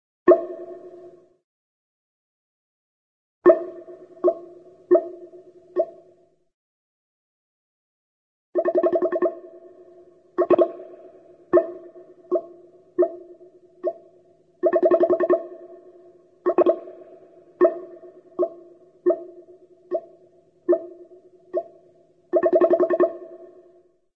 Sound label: pop, cartoon, single poit, single poits, several poits